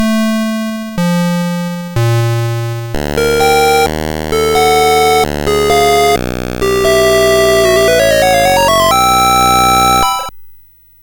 Musicbox, sid MOS6581R4

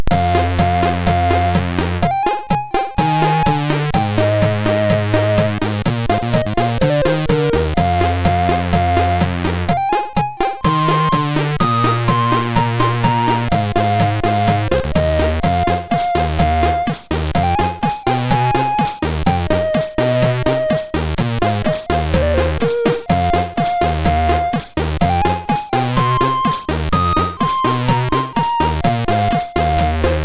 sid, MOS6581; Hit-Points

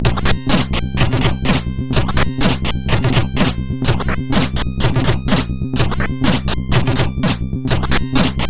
sid MOS 6581

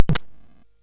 Shuffle, CSG8580R5

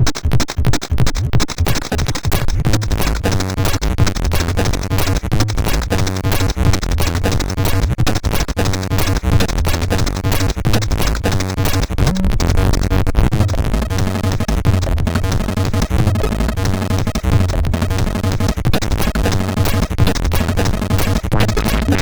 sid CSG8580R5; Due Monthly

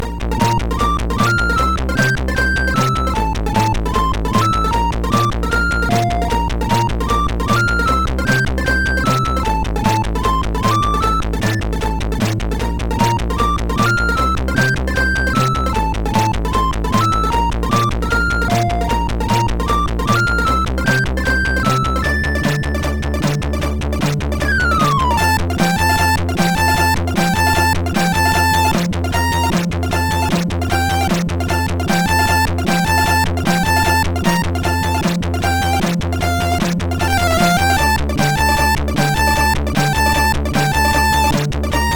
Punkadiddle, sid MOS6581R4

